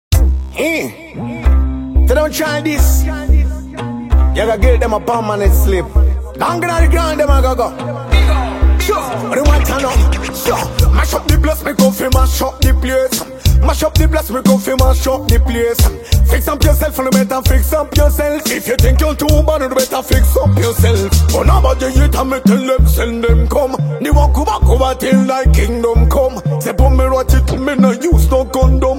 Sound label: FreeStyle Riddim